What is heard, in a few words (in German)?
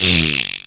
furz